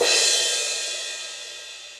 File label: Crash 18 Classic Muffle, Cymbal; Drums; Drum Hits; Standard Hits; Session Drums Studio